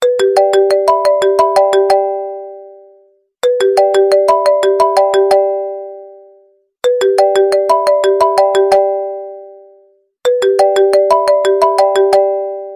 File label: iphone original ringtone